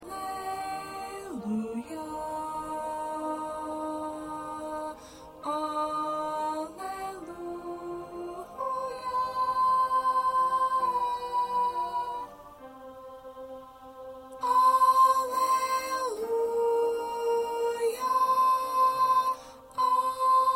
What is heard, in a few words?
1st Alto